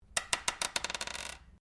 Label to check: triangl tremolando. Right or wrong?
Wrong